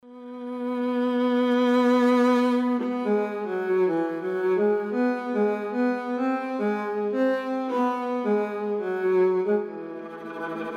Emotional Viola Nordic long Ornaments

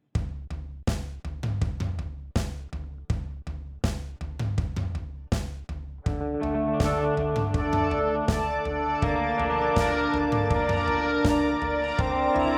Zoom G11 Ambient

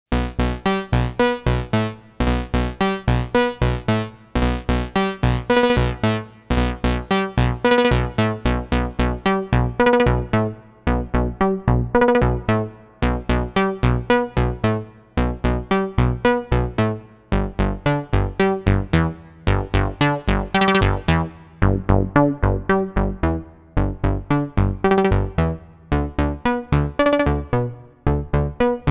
XAOC Test Transpose

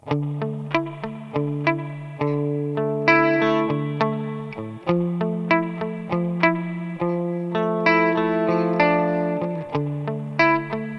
Boss RV-200 Spring

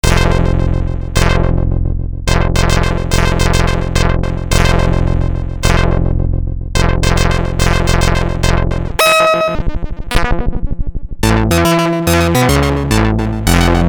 Subsequent 37 Early Birds

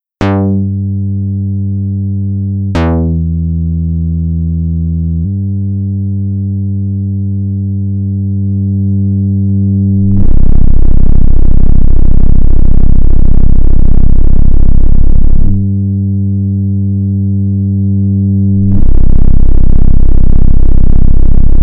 iMini Volume Distortion; CD Bread

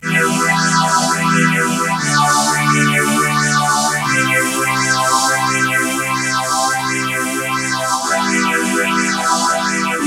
Vintage String Ensemble, EHX Bad Stone

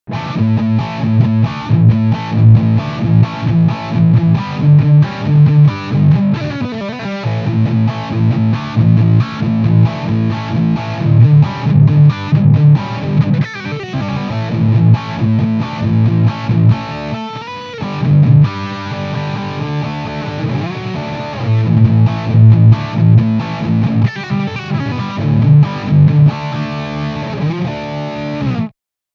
Riff

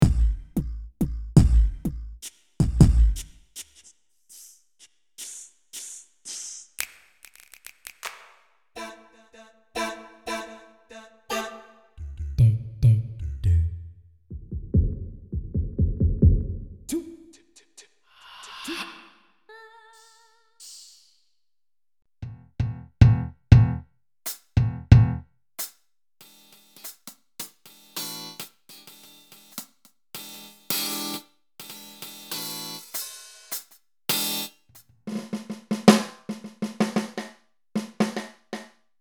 Kit

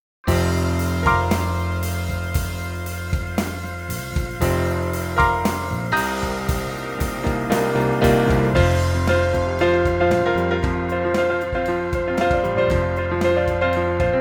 Kurzweil PC4 Rock Funk Song

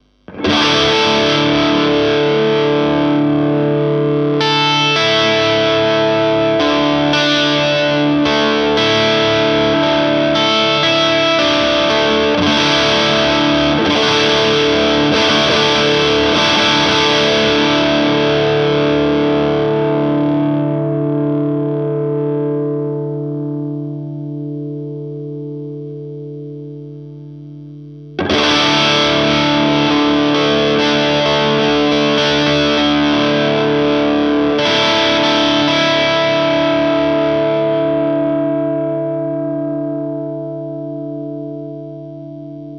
Music Man Mariposa

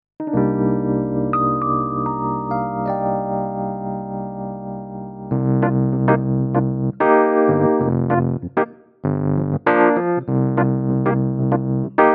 Kawai ES8 Classic EPiano

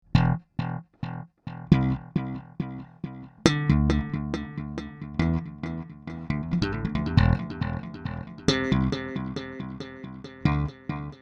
Bass Digital; ReTracer

